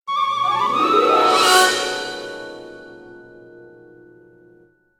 Music Stinger; Music CTC Stinger; Costume Quest 2

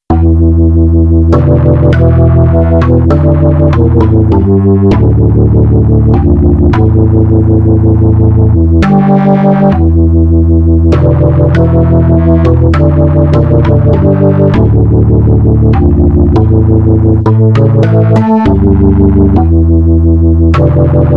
Tuba Weirdness; vietcong game soundtrack; Fist Alpha

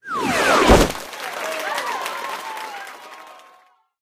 Rewards Popup; candy crush saga gamerip

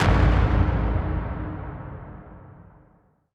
dramatic reveal

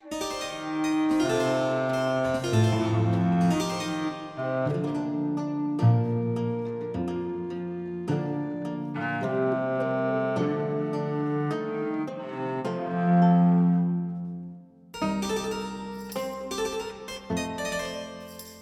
Explore Music Loop; fat princess adventures; ps4

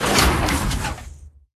Warmonger Rocket Fail Engine

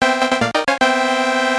Round Clear; Namco Museum Vol.2; PSP; Motos

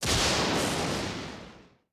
Lightning

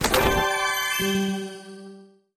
open chest rare; Dungeon Monsters; android

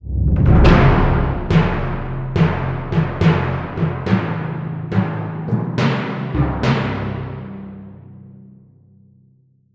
Start round

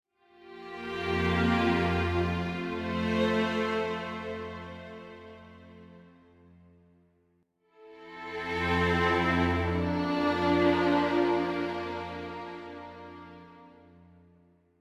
bgm office; Meitantei Pikachu Shin Konbi Tanjo

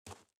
SFX run gravel

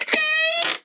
Door Open Residential

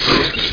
mecha walk

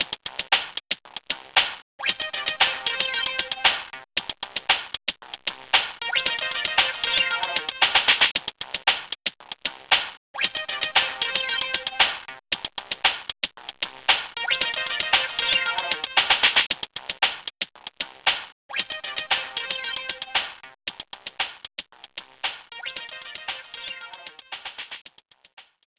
BGM Ghettoblaster; Baby Life; nds

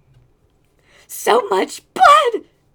Male Reactions

